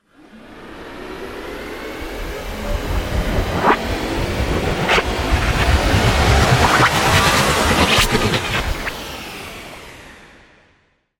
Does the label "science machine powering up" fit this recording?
Yes